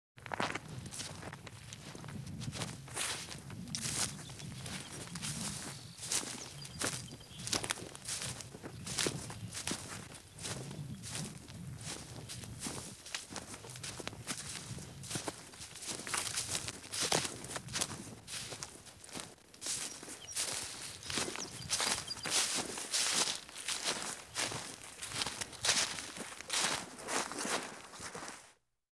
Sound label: one person walking, Grass and Dirt